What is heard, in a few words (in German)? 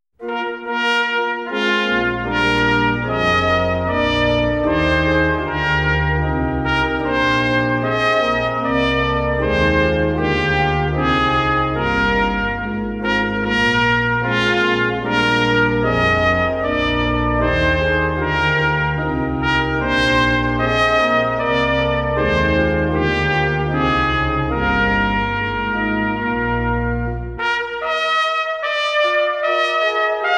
trompete u orgel; karlson